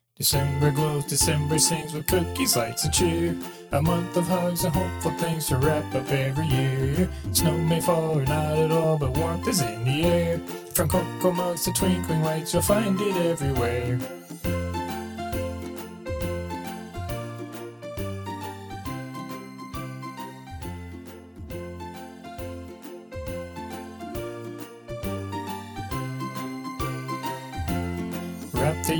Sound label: Jingle Bells, jingles